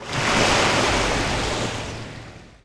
wave; Tony Hawk's Project 8; XBOX

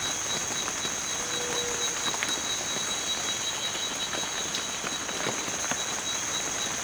jungle rain; ambience; Soldier Of Fortune II Double Helix; XBOX

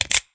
Revolver Close; Weapons

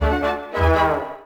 Pickup

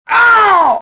death; Star Wars Jedi Knight II Jedi Outcast; XBOX; jeditrainer

